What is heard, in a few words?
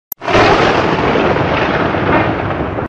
Lightning Strike